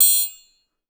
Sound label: TRI STC HI, orchestra; Best Service; Peter Siedlaczek's Advanced Orchestra